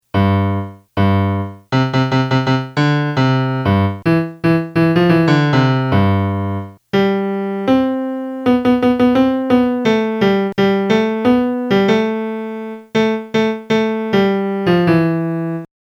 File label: melody